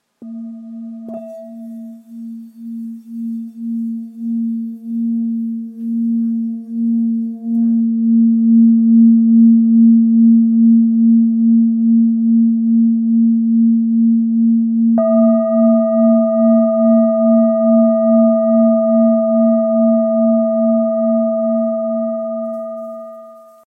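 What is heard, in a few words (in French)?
Full Moon la diese, bol chantant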